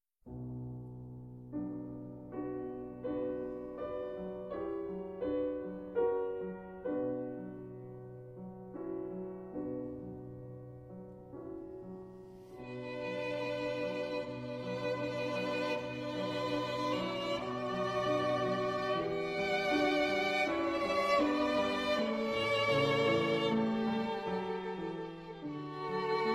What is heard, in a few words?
Duets for two Violins and Piano